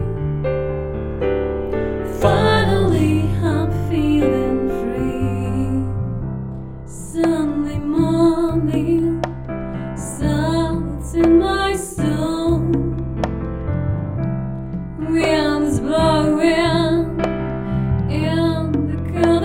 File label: the voice and piano